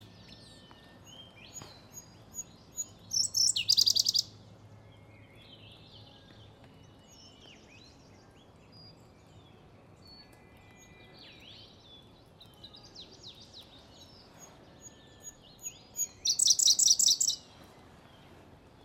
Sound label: robin indian blue Larvivora brunnea